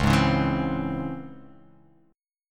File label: C sharp Minor Major 7th C sharp, chord